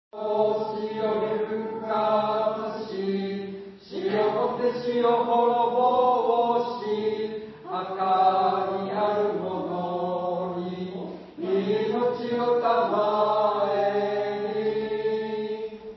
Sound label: hymn; japan; kyoto